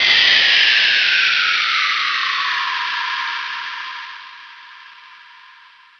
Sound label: electric snake, zaps and beeps